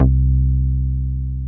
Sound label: JP BASS C2; SYNTHBASS